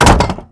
wood; Mafie 1

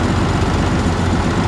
truck; Mafie 1

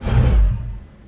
cord off; Mafie 1

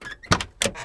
door op; Mafie 1

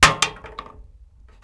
metal; Mafie 1

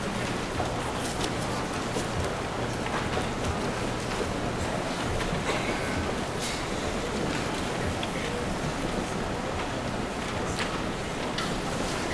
ambi; Mafie 1

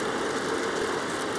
vent; Mafie 1

